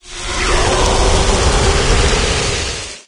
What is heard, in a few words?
burn; doom3; archvile